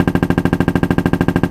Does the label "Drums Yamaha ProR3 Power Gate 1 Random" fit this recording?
No